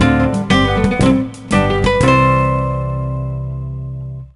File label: Jingle Good; tamagotchi connection corner shop 2 ds; Hime